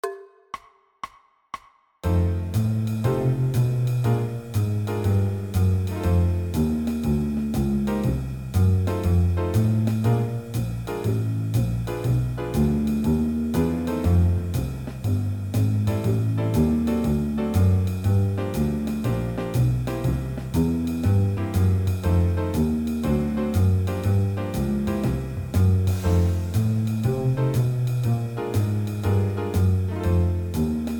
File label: Blues